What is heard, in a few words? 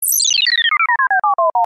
computer sms